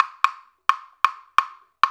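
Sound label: block loop 130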